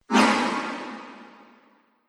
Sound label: Arcade Game Dungeon Spell Sound; Video Game Sound Effects; Retro Inspired Sound Effects - Think Nintendo, Atari, C64; Andrew Fleming